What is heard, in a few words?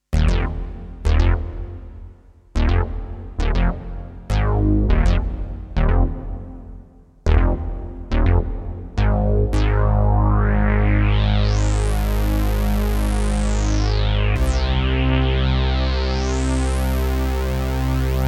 Roland JX 08